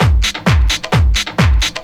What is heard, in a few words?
drum 130 tribal, drumloops